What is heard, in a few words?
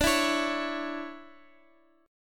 D Major 7th Suspended 2nd Guitar Open F, chord; strummed